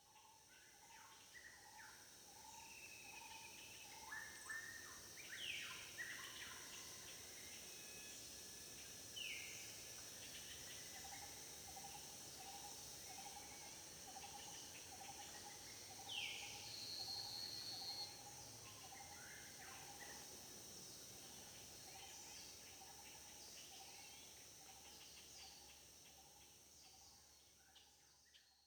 amb generic outside day; Lucius III

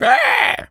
pig scream short

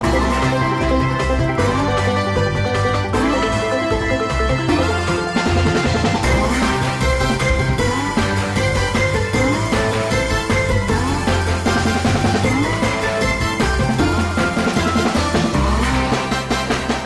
Music Main Menu; Calling All Mixels; 2014 mobile gamerip

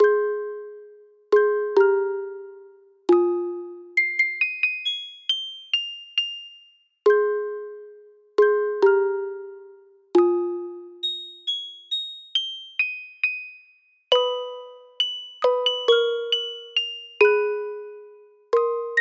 Disco, Music Box; animal crossing new leaf welcome aamiibo soundtrack

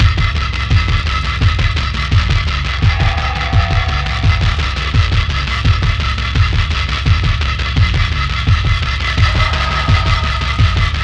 Creature Attacks; soma gamerip 2015

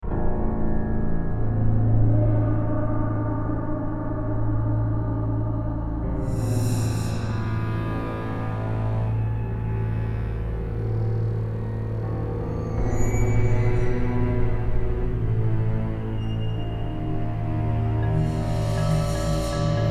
Eerie Background; The Book of Unwritten Tales

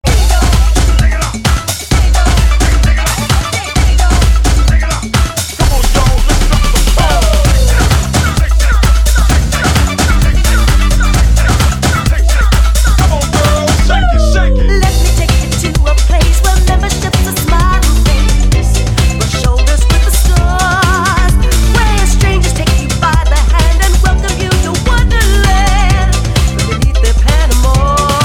Solo Bass Mix; Dance Dance Revolution; DDR

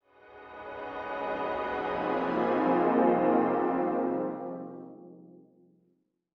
Health Flourish; Insanely Twisted Shadow Planet

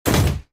Cars Impact; Tank Stars; android

